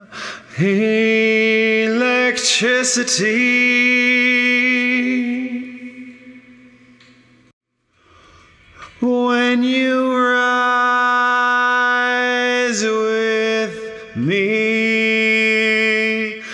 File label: Lead Vox